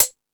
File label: Beat Box, E-MU Pro-Cussion; drum machine